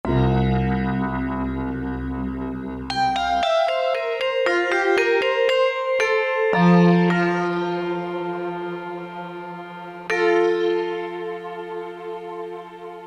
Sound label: Fantasy, Roland U-110; Factory Patch